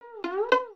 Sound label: Wind Up; emotes